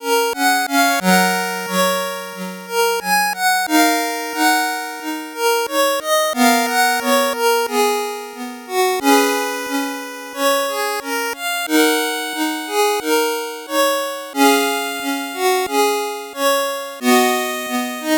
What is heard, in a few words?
8bit bgm fast